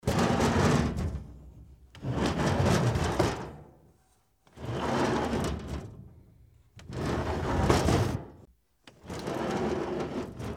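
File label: sliding door; hikido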